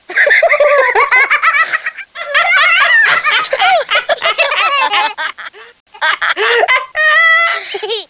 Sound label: laffs; The Land Before Time Activity Center (Sound Source Interactive) (1998); flying